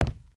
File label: step wood; STALZONE; minecraft